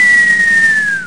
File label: bomb falling; TANK-OFF II